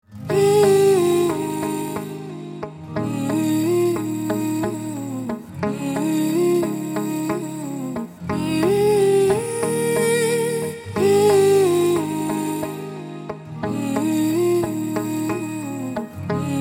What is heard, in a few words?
Humming Tone; BG; Hindi Ringtones; 21 Sec; from Baaghi 2 Movie